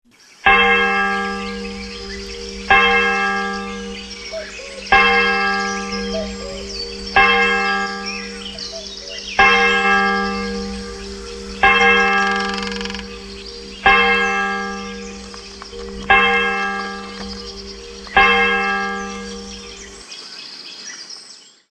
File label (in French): cloches de Fourviere